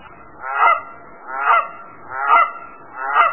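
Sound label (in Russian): очень необычный крик молодого гуся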